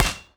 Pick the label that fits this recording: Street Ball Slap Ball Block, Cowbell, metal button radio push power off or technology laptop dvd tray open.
Street Ball Slap Ball Block